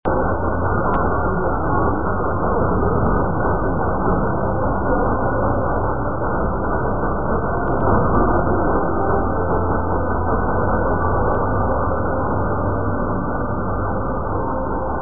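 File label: Lithuania, radio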